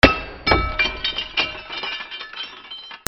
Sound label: FALLING